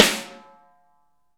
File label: SN, Real Mega Drums